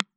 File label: GEC big dynamic